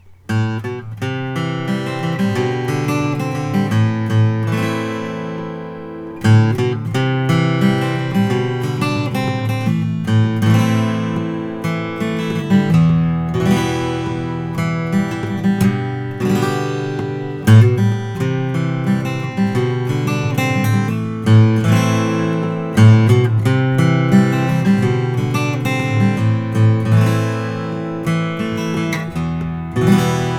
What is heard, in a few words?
Martin custom OM 45 Adirondack; natural